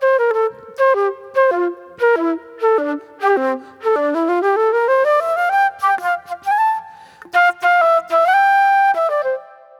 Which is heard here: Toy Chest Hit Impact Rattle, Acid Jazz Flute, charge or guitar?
Acid Jazz Flute